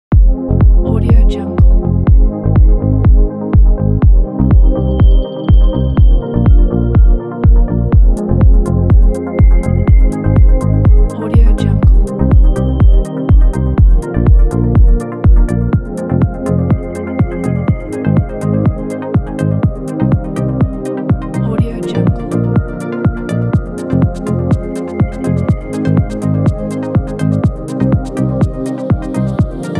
deep house chill

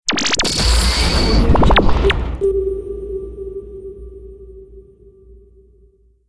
radio image impact; alenalt

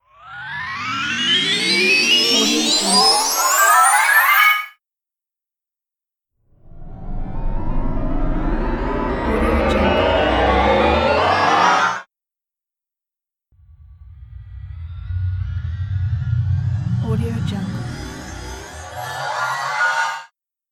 powered up scifi thruster

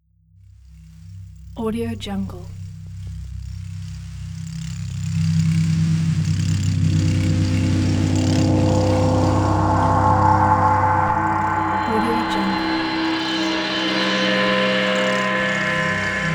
drone atmosphere

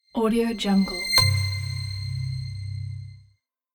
logo sound

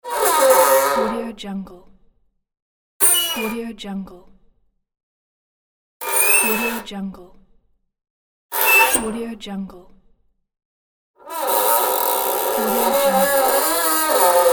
metal door hinge creaking and squeaks

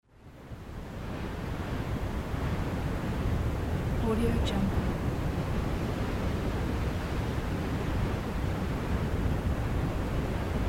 wind; volkovsound

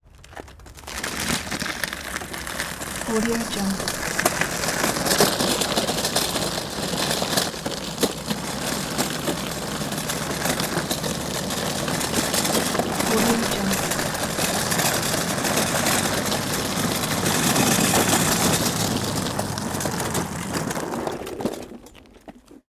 metal wagon red radio flyer style weighted; prosoundfx

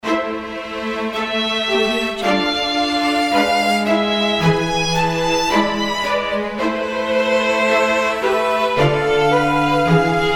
bach strings sonata

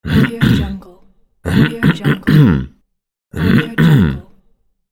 man clears throat

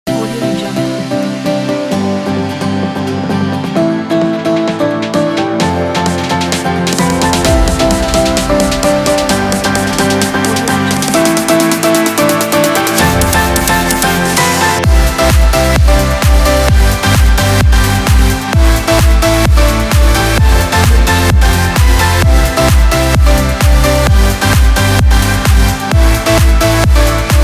dance

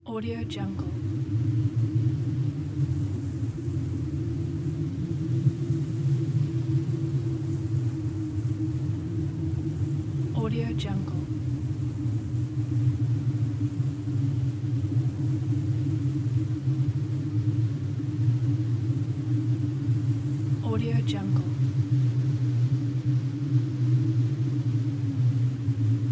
winds

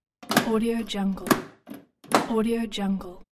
household door deadbolt turn

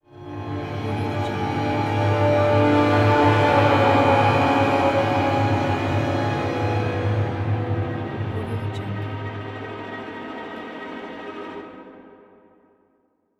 horror stab

